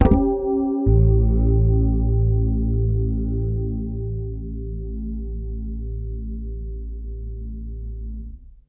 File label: FRETCHORD A7, PEDULLA BASS; Masterbits CLIMAX 7 - Bass